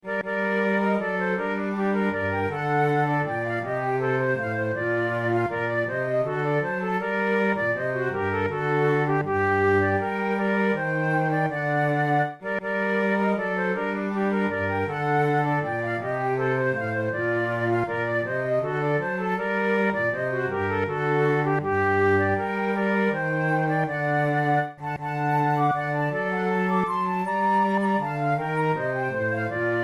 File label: trio sonata corrente, flute tunes; corelli